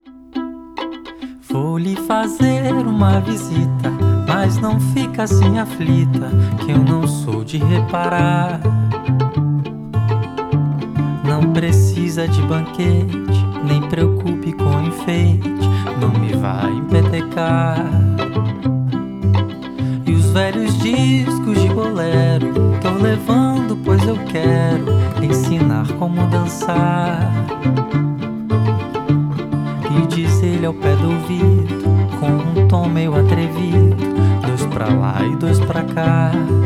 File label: Vocl